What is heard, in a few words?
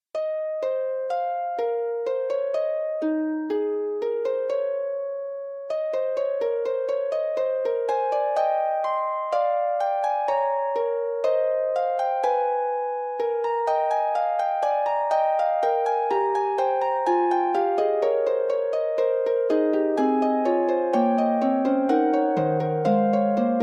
Fugue, harp; Forty Eight Preludes and Fugues Book 2